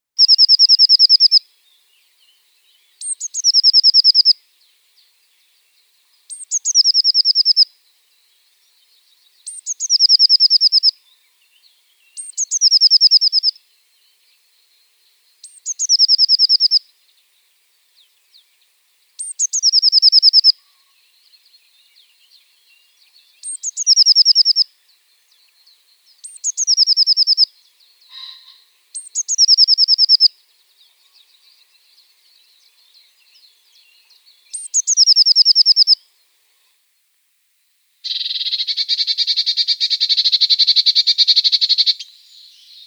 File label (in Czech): zvuk, ptaci